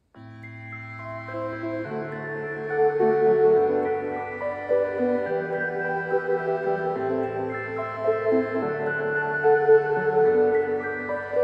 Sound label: Omnichord